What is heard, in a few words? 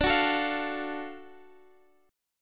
Gb Minor Major 7th Sharp 5th, chord